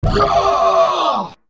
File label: Shouter Shout